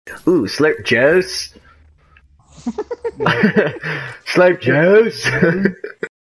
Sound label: slurp